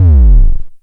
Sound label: KLONE BASS, Klone Dual-Percussion-Synthesiser; Drum Machines